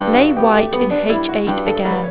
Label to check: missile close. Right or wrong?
Wrong